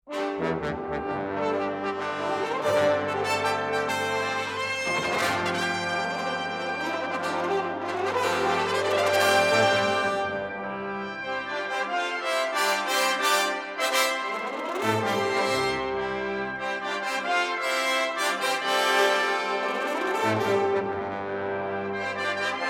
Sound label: Fanfare, Brass Ensembles; Crespo; Spirit of Brass